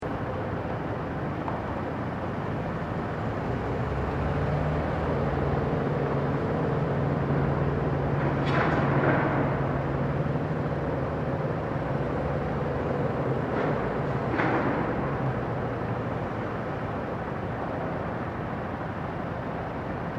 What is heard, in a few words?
Construction, Distant coustruction set with constant machinery. Cranes moving; Cranes moving occasional with debris and hits from 0:26. Civilian people high he; Construction Sound Effects